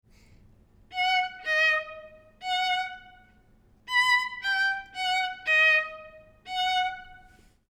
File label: melody 2nds 3rds